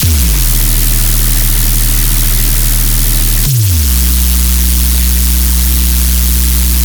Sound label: Bass Loop D# 140BPM, Dubstep; Fairy Bass; Ghosthack - AC2024 - Day 13 - Dubstep Sounds 2025